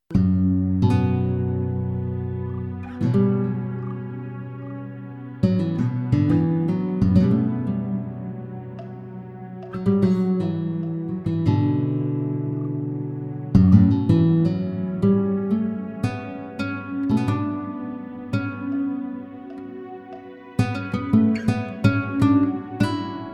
Guitar Only; Mexico; Port Royale 3; gamerip 2012

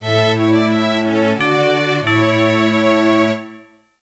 Jingle Before Study; Kirihara Shoten Kanshuu Daigakusei Ryoku Kentei DS; NDS

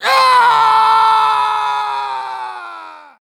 Male Death Scream; Lucius III

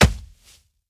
Sfx Punch; don t be afraid the first toy

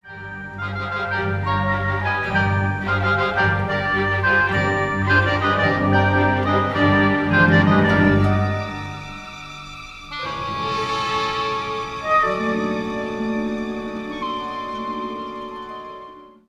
Exploration Calm

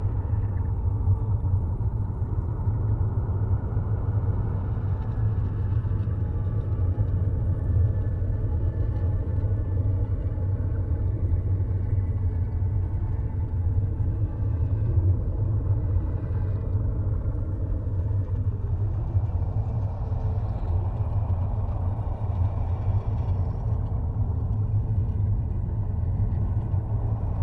Ambient

